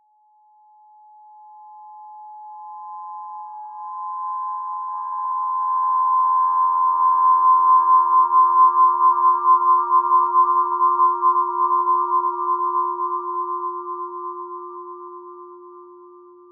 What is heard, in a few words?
Hit, Earth & Steel